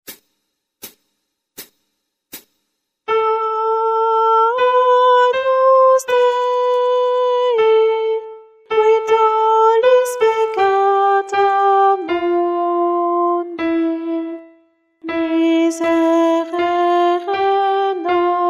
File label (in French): Messe de St Paul Agnus Guide chant Soprane